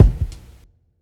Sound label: Kick